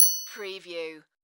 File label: Multimedia Bell